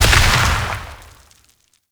Proc Explosion Max; Warframe; Mods

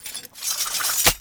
Idle Sword Twirl; Arthur Hub; Nineteen Ninety Nine

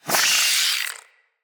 Root Spider Elite Vocal Pain, spider; Animals; Spiders; Vocals

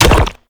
Ink Rifle Reverse Ink End; Warframe; Tenno

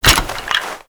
Bunraku Puppet Movement; Warframe; Koumei

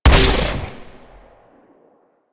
Amp Chassis Fire, Amplifiers

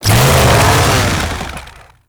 Saw Forward Combo Rev; Warframe; Kuva Ghoul Saw

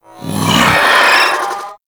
Pet Vocal Pain; Warframe; Alad V Pet

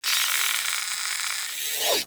Arsenal Working Loop; Liset Machines; Tenno

